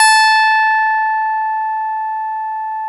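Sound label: OB8 piano A4, OBERHEIM OB8; Big Fish Audio - First Call Vintage Keyboards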